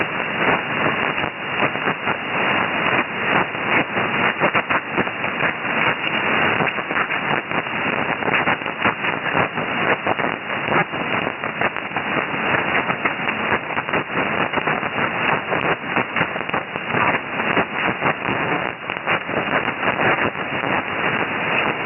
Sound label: am bc splatter 7.199 mhz lsb